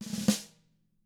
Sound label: ROLL, SNARE ROLLS; ILIO; Double Platinum Drums 2